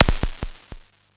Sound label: crackly kick, Electro FX; UNUSUAL KITS and FX; Atomhard Electro FX